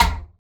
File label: Percussive